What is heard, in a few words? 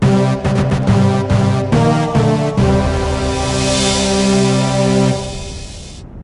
start; Duel Arena